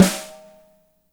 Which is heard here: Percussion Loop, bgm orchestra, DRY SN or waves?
DRY SN